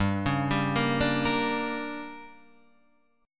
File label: D Major 7th Suspended 4th Sharp 5th, chord